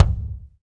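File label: bass drum, Roland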